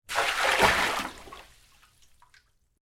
Water Pour Into Tub; BlastWave

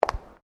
FOOTSTEPS WOMENS HIGH HEELS PAVERS STEP

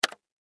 Technology Computer Keyboard Single Key; Typing Sound Effects; Typing (Sound Effects:Offices)

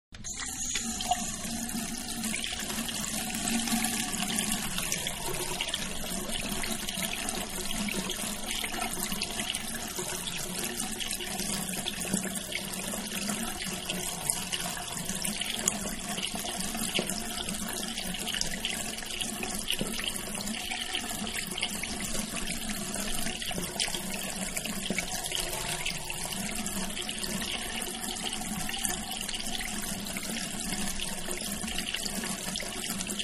Sink Washing Hands; Bathroom Sink; Bathroom (Sound Effects:Household); Manhattan Production Music

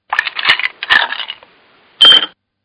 HIGHBALL MIX DRINK, Drink Mixing; Partners In Rhyme

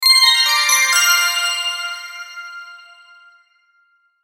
Logo Bells Melody Bright; Sonic Logo; Blastwave; Sonic Logos; Production Element Imaging Element Accent Transition

